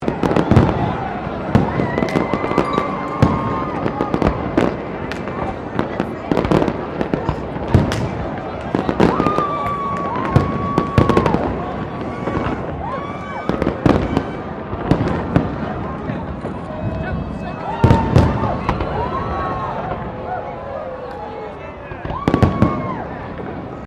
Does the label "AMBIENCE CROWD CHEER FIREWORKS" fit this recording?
Yes